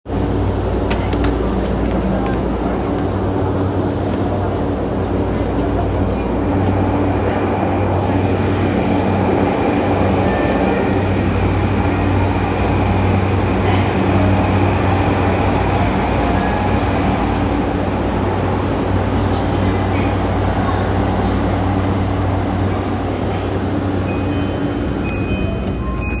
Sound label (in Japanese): JR山手線目黒CCW